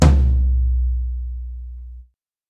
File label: TOM, Jungle; Gorge Sample Pack Vol.1; San Gabriel